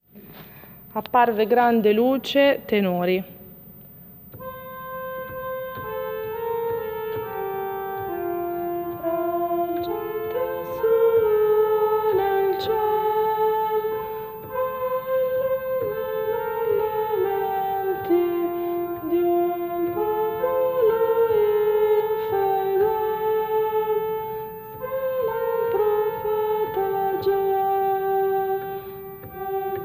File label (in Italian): tenori